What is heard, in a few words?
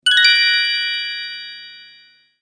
Twinkle sound effect